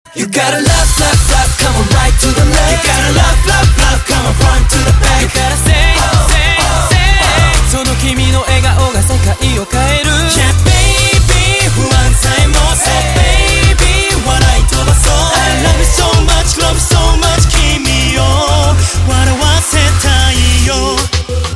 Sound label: laugh laugh laugh